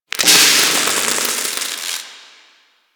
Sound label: Broken Light Flicker; Warframe